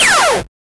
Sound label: weapon shoot 8-bit